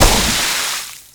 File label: Pool Noodle Slam